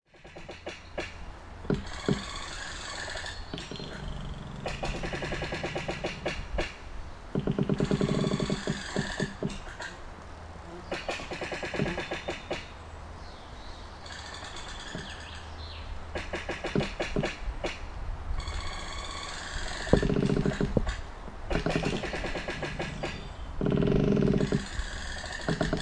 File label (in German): Baum Knarren; Fallen needle-trees rubbing against another in the forest